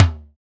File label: tom